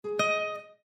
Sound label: Exclamation; abbottabad compound